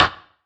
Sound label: perc organic sticks